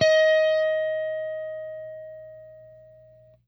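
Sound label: jazz line, guitar; Quantum Leap - Guitar & Bass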